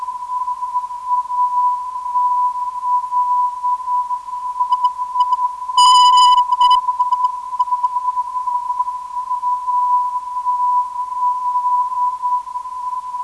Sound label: 422kHz, ndb